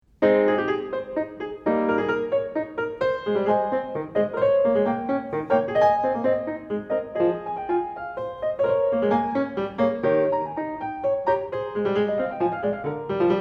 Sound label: Prelude and Fugue No 17 in A-flat major, Clavier; Johann Sebastian Bach; Well-Tempered Clavier